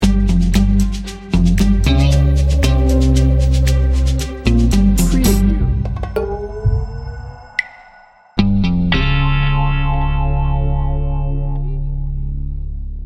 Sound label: trouble ahead sting bumper